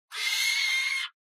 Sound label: owl attack; animalsvotive; Minecraft; Votive Content